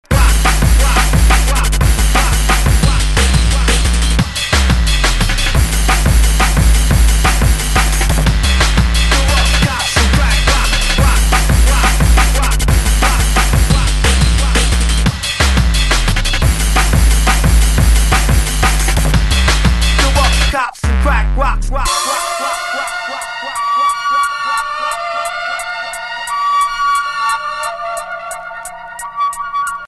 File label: remix